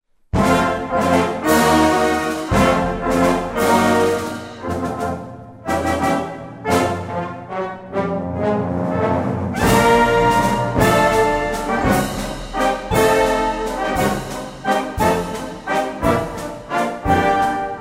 Walzer

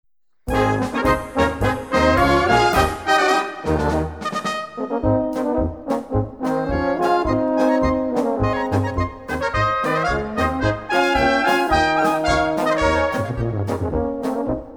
Polka

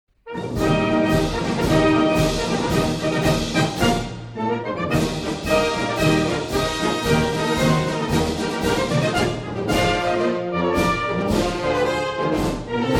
Marsch

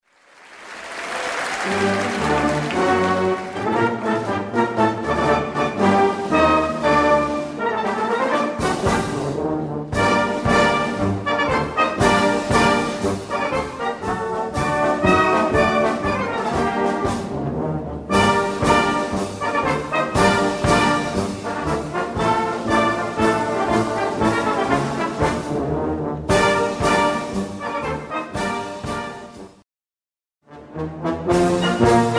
Marsch

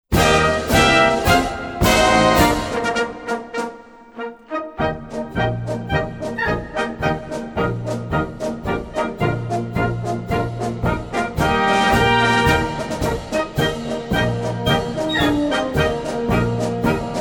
Marsch

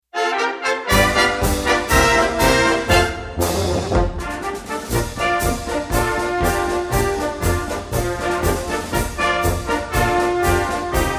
MARSCH

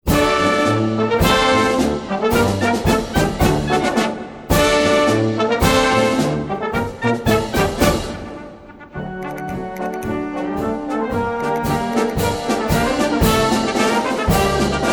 Marsch

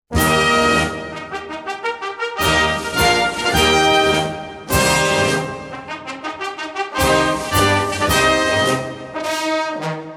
Marsch

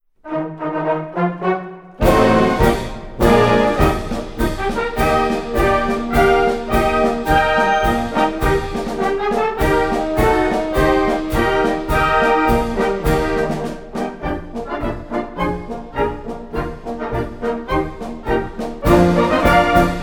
Marsch

